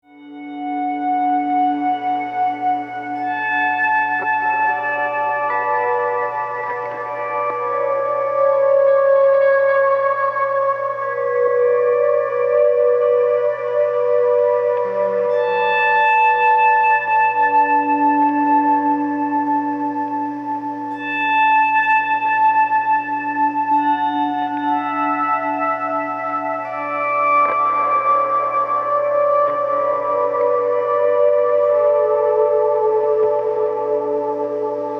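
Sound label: big sky swell 335 clusters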